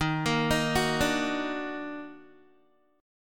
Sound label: Eb Minor Major 7th Eb down Guitar, chord; EbmM7; arpeggiated; JGuitar